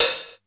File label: OPEN HH, D110 DRUMS; Crypton - DrumBase5000